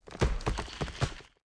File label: Legendary Tree Idle